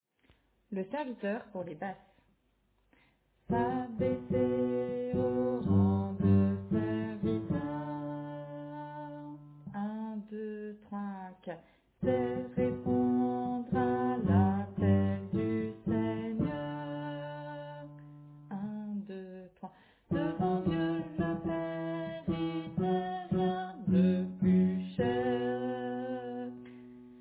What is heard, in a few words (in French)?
Basse